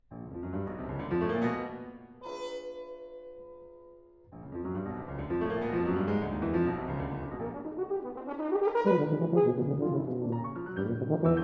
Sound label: Divertimento For Horn, Tuba And Piano - Scherzo